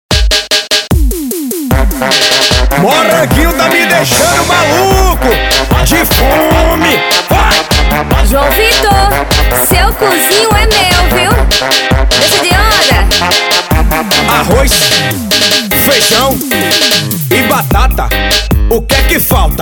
swing

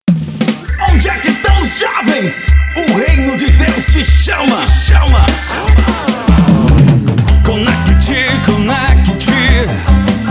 jingles; conect jump vale

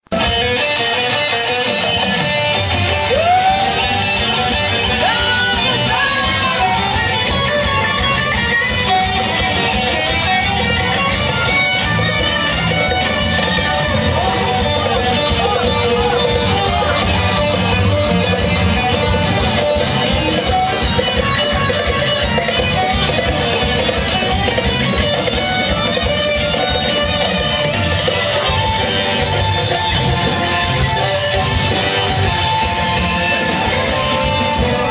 instrumental rock, ao vivo; Caicó

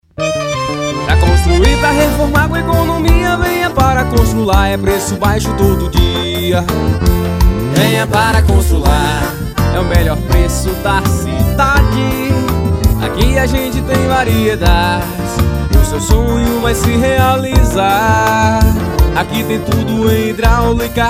jingle

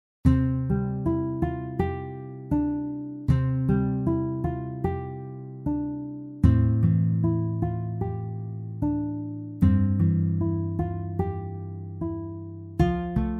meditative; beto bia original soundtrack

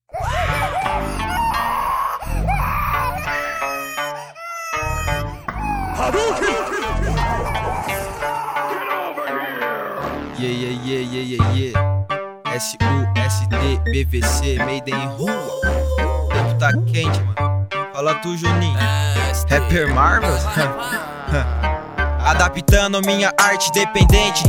rap

